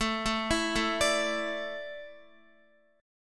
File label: A Suspended 4th, Guitar; Asus4; arpeggiated; x,12,9,9,9,10